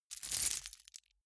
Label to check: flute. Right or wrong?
Wrong